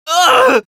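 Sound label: pain severe, Spanish; Scout; Voice file from Team Fortress 2 Spanish version; Scout audio responses/es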